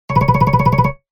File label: iphone 3g blunt ringtone